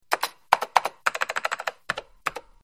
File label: Computer Keyboard